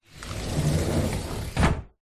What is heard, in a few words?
household window sliding close; zapsplat